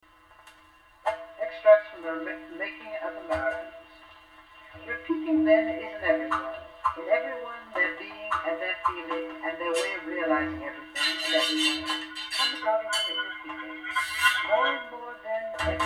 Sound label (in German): klangkugel mix